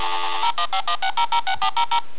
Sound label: dial